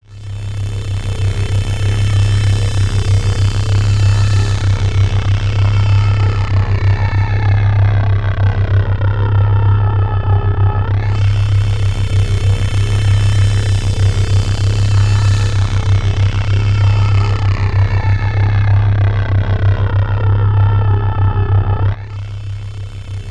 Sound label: Odd Looper; AMG - Prototype Drum & Bass